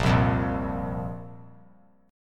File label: A sharp Suspended 2nd, down; strummed; JGuitar